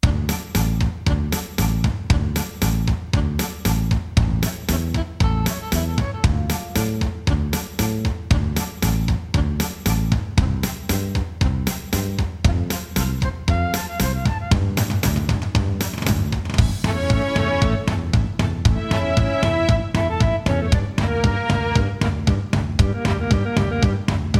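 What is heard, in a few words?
Paso Doble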